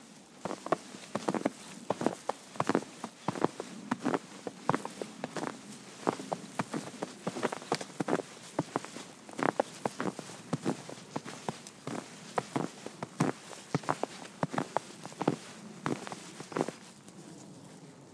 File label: the sound of snow under foot; ashmore park